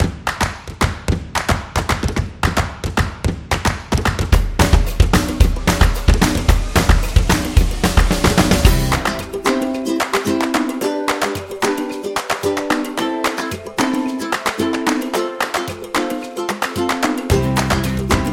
Happy Birthday Folk; birthday music

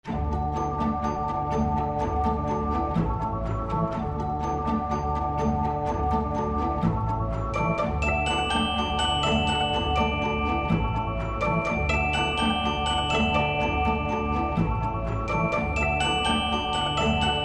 Christmas Commercial

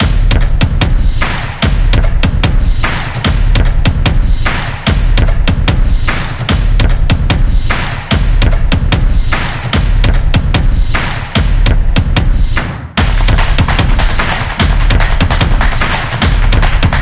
Upbeat Drums

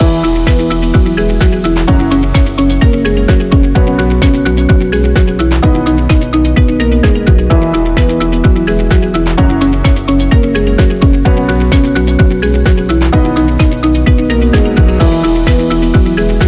Upbeat Loop Corporate; corporate interview

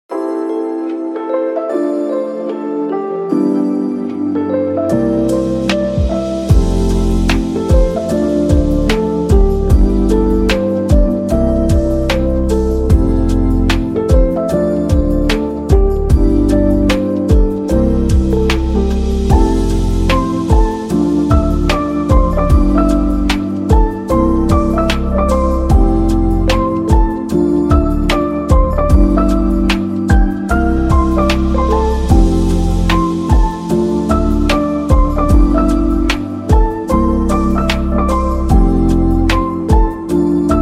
Jazzy Lofi

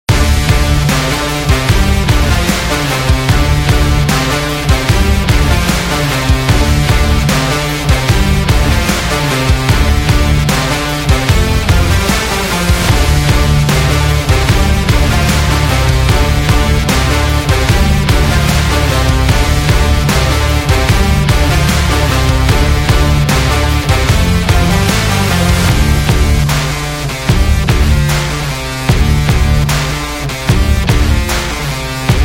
Action Rock Loop; gym rock

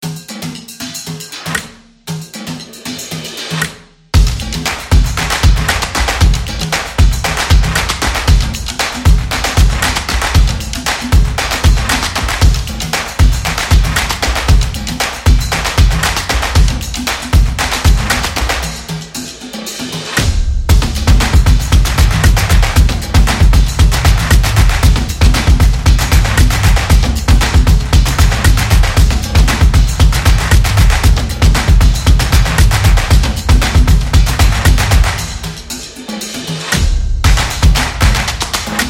Fast-Paced, drumming action

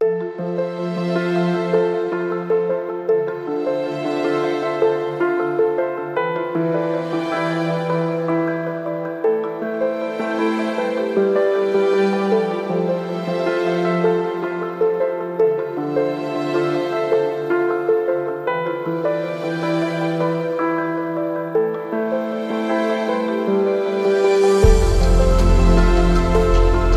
Inspirational Ambient

